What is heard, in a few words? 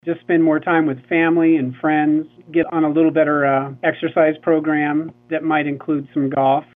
rhodes